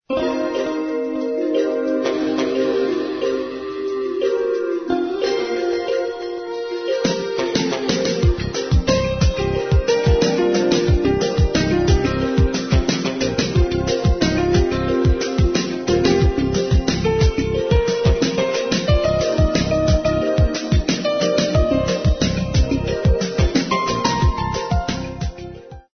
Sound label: new age